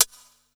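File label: closed hi-hat, Drum & Bass, 160 BPM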